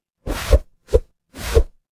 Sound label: transition swoosh